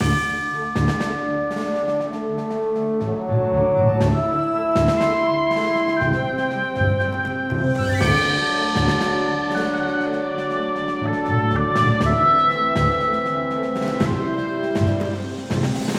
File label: BGM VAILAGE; IdleGirl